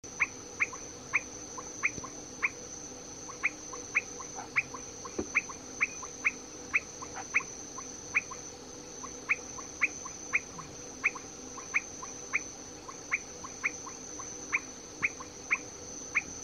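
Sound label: Leptodactylus longirostris, frog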